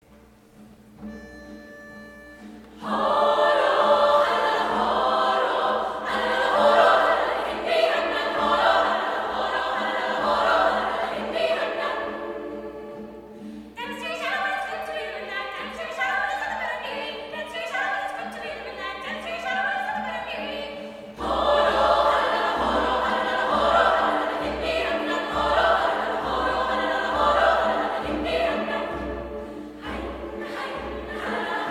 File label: Mouth Music